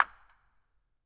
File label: incinerator dirac